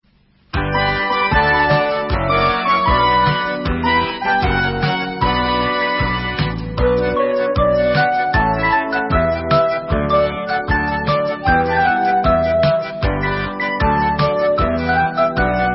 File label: Hora Instrumental